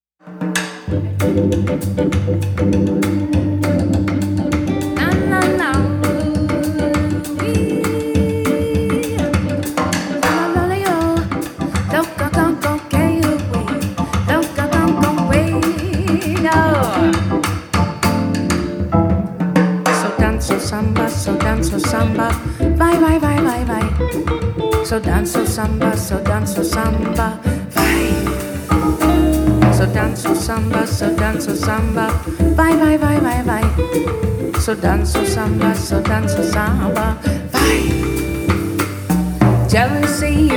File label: Samba